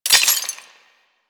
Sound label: Quill Dart Gun Mode Switch Ice; Warframe